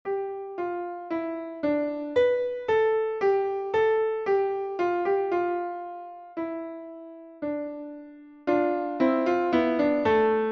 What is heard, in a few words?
Phrygian Fugue